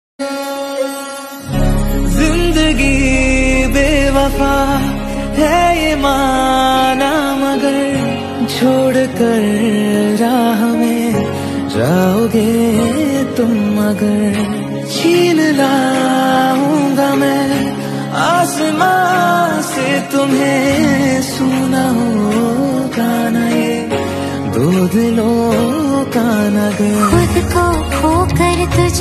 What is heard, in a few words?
love song